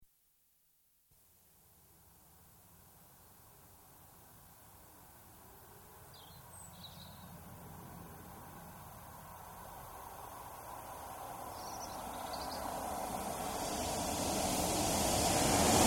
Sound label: Diesel Express Train, high speed pass left to right; Trains; BBC